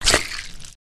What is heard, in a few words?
Slime Splash; frica de gaze